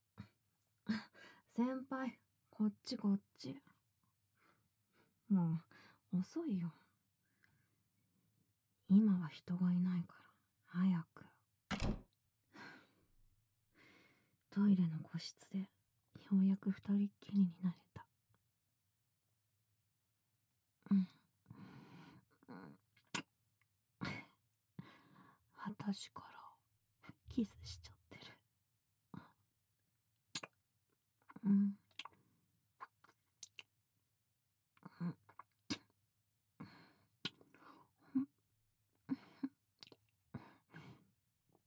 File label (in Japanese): 耳舐めたっぷりあまあまセックス, ASMR